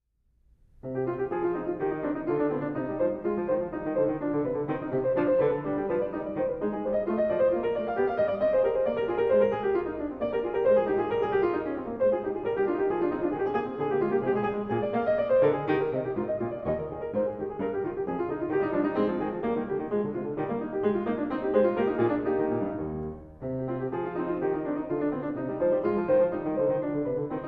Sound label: Prelude And Fugue In C Minor Praeludium; BWV 871